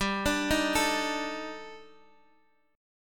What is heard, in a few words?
Eb Major Sharp 11th G